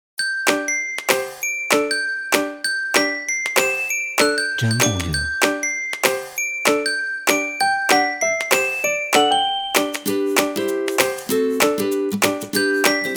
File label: Happy Acoustic